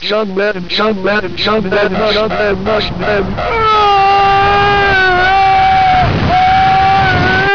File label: pit drone melee attack